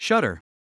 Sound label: SHUTTER